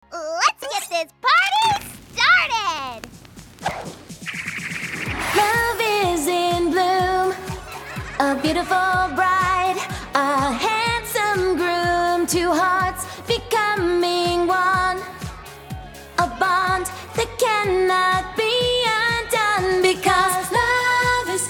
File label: Acapella